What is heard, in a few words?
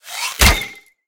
Fight Attack Bow; Mighty Party; Android